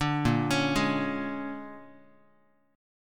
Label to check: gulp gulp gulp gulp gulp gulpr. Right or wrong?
Wrong